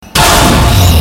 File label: Gong